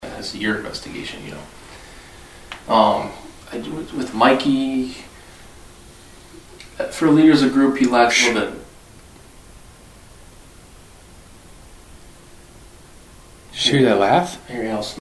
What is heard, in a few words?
willow creek farm master bedroom female laugh